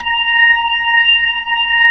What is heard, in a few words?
B-3, Jazz